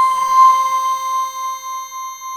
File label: marine C5